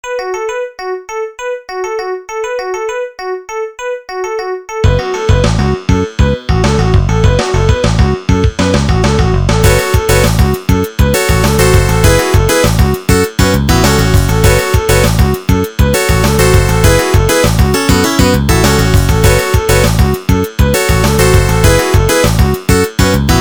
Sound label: radio bgm